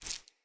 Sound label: Grunt Foley Step; Enemy; Corrupted Sproutfolk